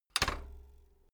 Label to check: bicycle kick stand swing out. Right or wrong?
Right